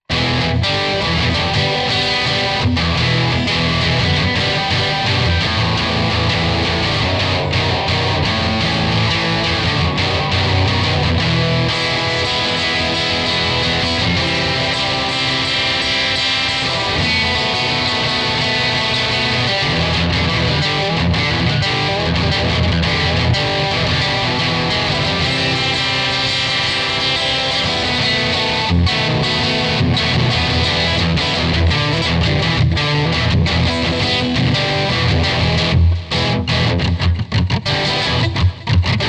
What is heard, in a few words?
electric